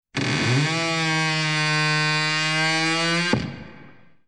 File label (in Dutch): gang Deur Dicht